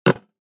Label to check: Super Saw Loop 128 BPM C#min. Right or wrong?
Wrong